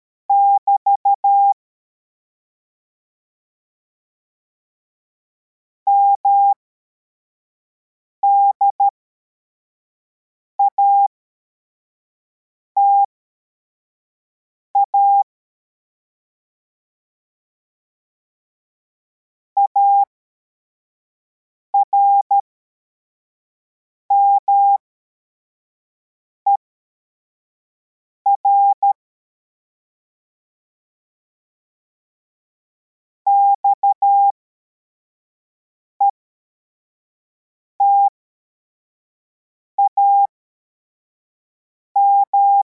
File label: cw, morse